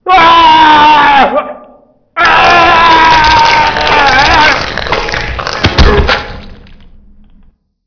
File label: meltdown